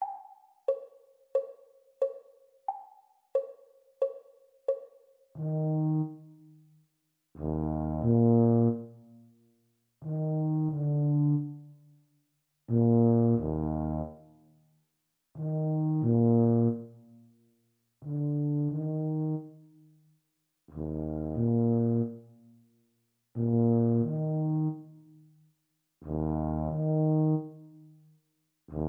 Eb Tuba Duet exam speed; Grade 1

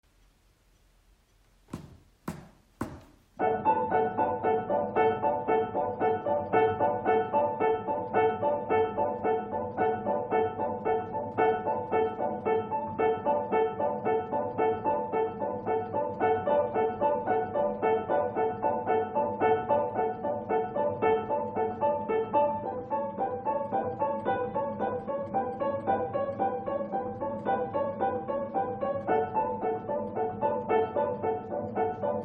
Piano Duet exam speed; Grade 3; teacher parts

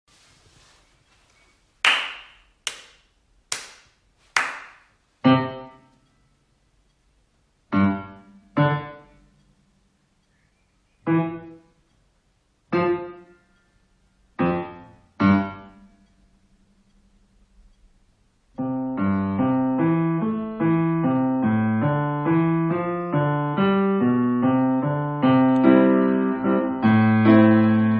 Piano Duet practice speed; Grade 1; teacher parts

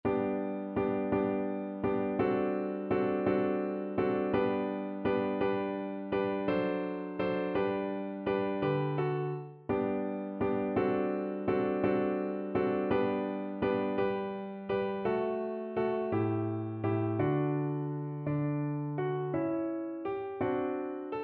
thirds accompaniment high voice; Grade 2; MTB Singing Vaccai

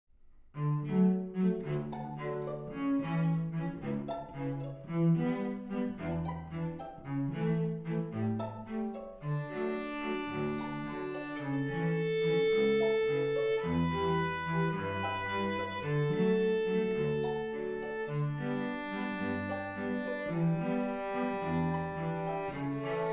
with violin

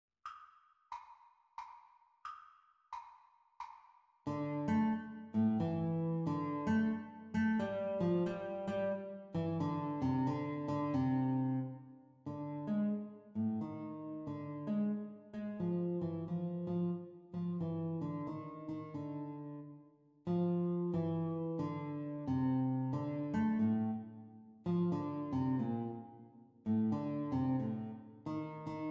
Guitar Duet Playalong Exam Speed

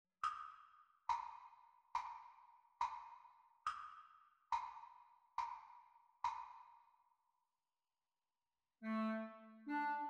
Practise Recording Clarinet Duets; Grade 1

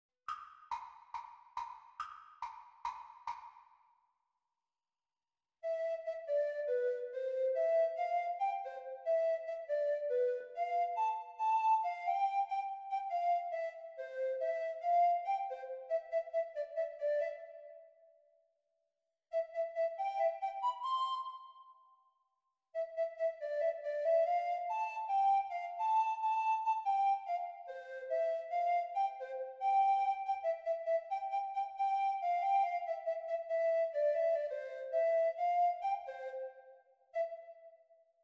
Treble Recorder Performance speed; exam speed

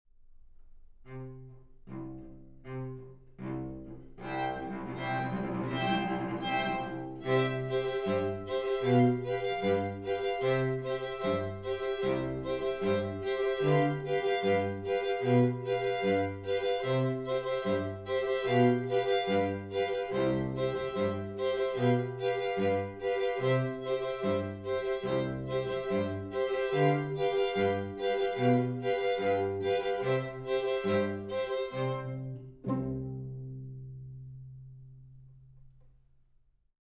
Can Can without piano

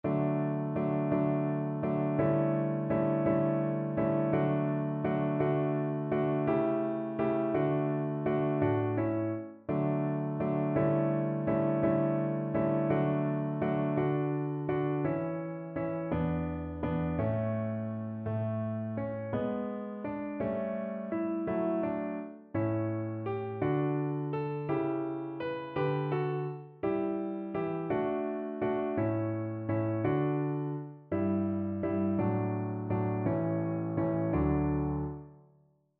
Thirds Accompaniment Low voice; Grade 2

